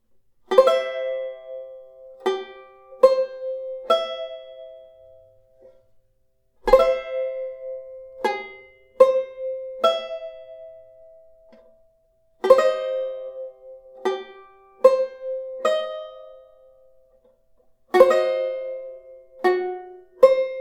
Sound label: 2nd Triads; Beyond Chord Melody Volume II